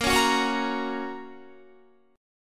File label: A sharp Minor Sharp 5th, down, Guitar; strummed; JGuitar